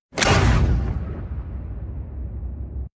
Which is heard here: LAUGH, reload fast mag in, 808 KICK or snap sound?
snap sound